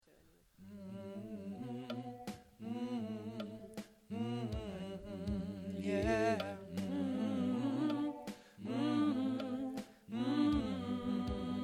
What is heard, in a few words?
Mix; training recordings